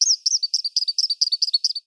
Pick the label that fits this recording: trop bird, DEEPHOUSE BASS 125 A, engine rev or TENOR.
trop bird